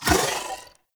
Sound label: Dodge Impact; Warframe; Pacifist